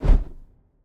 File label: Hawk Flap